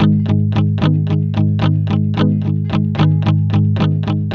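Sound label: ROCK G minor 110, Vintage Blues Guitar; USB Soundscan vol.22; AKAI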